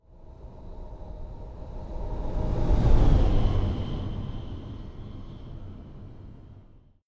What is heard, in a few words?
sci-fi vehicle train passing long; raumschiff